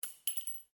Cartridge 22 caliber long impact concrete, shell casings; shell casings of different calibers impacting on different surfaces